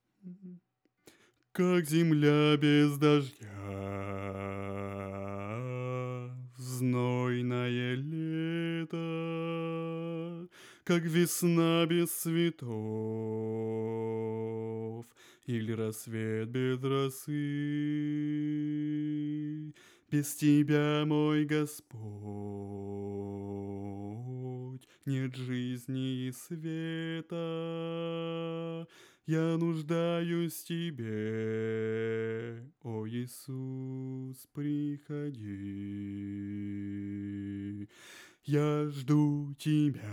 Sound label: bass